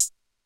Closed Hat

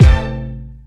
BD string layer

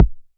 808's

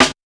clang snare

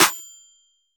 Snare Clap, 808; Sample God

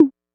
tom one shot hi electronic; Percs

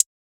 hihat one shot hard; Closed Hats

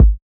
kick roots; Kicks

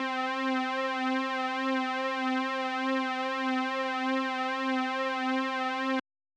Lead

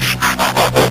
Break Down FX; Trapaholics

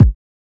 Kicks

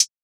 Hat

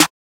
Snare; Southside Bape Hi

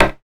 Snare; SouthSideOG

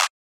Clap

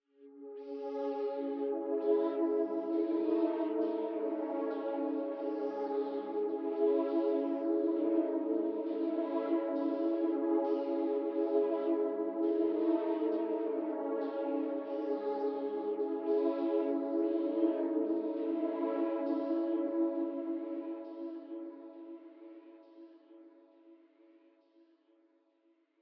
Pad; Boomin-Beat-Starter-6

